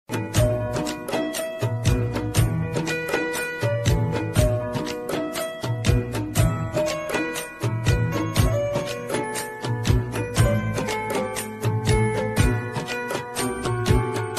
song bgm

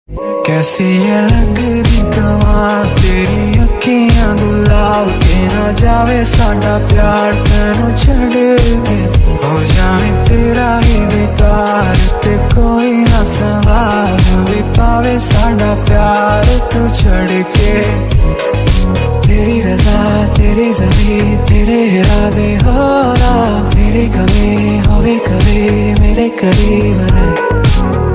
bgm ringtone love ringtone; shahid kapoor and kirti sanon new movie song

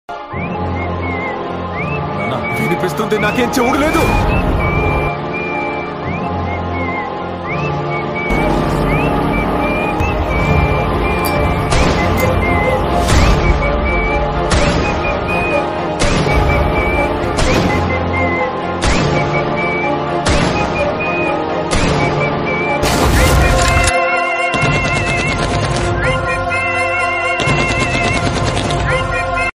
animal trailer bgm

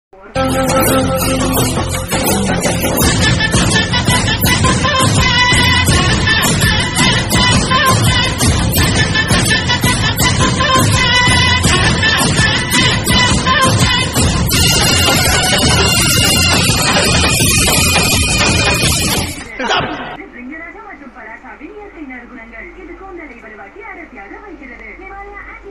climax bgm mass; pudhupettai; free fire

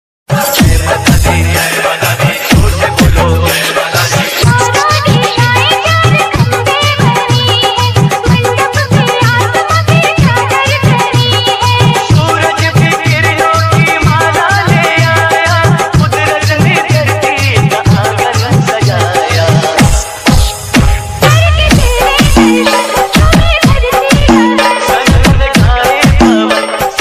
bhakti ringtone devi song ringtone; navratri

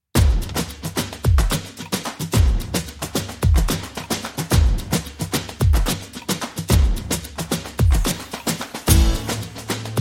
BGM; Ayalaan; Ragala

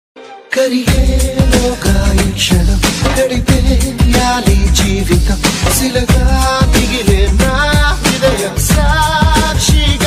sad bgm telugu; arya

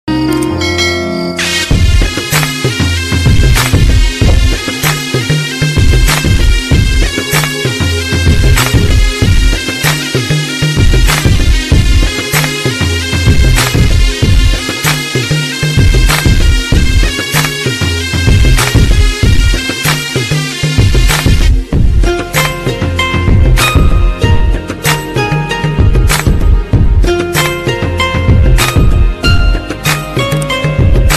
tamil sad bgm tamil love bgm

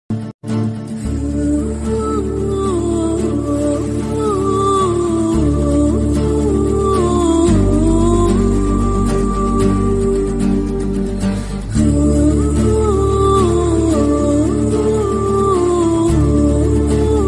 movie bgm ringtone telugu music; ramcharan

